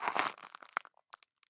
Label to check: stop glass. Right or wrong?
Right